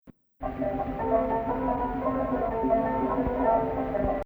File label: Rast Fein Ya Gameel 2nd Rast